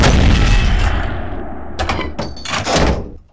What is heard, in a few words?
fire